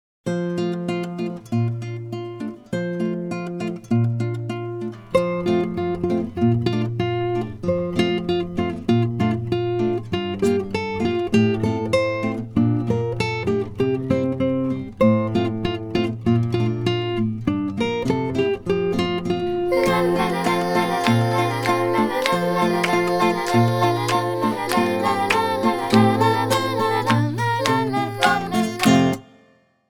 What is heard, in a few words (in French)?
accompagnement guide